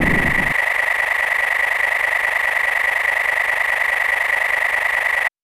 Charging Beam Full; Samus; Super Smash Bros

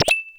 Bram Ball Attacked Underneath

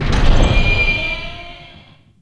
Bowser Door

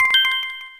Coin Appear

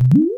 Boo Door Disappear; New Super Mario Bros. Wii

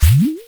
Ice Ball Hitting Fire Ball

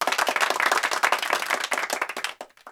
Clapping; New Super Mario Bros. Wii

